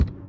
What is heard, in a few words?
Ticket Counter Perc; Moody Park; Houston; percussion